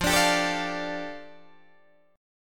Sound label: F 6th Add 9th F down Guitar Open D, chord